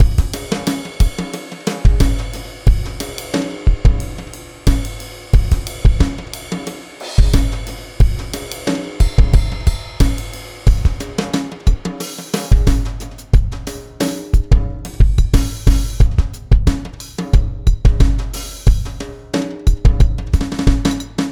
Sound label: Beat, Drums, Eclectic Electronica